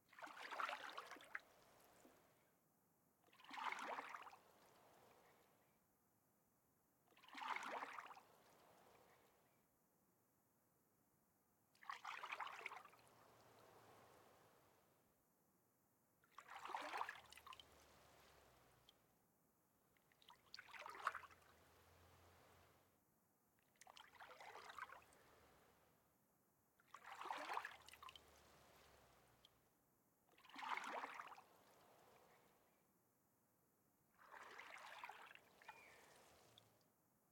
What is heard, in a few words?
Onsen Pool Loop; The Inn